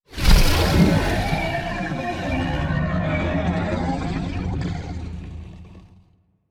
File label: sfx relic activation; ether drake trophy; Stellaris; ancient relics